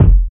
natural kick f key, Royality free steel kick drum tuned to the F note. Loudest frequency: 95Hz; kick drum samples; single hits one shots